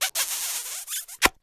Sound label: kiss